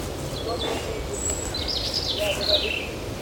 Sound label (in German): rotkehl; vogelkunde